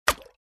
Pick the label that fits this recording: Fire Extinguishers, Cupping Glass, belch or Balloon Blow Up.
Cupping Glass